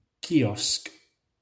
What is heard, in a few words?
kiosk